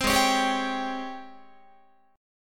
G sharp Major 11th G sharp, Guitar; G#M11 Chord

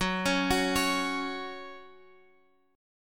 F sharp 5th F sharp; F#5 Chord {X 9 11 11 X 9} arpeggiated

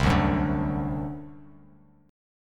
C Suspended 2nd Flat 5th C down Bass Standard; Csus2b5 strummed

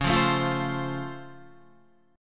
Db Augmented Major 7th Db down Guitar; strummed; JGuitar

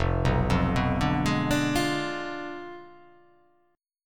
F Diminished 7th F down

